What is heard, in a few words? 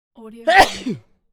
male sneeze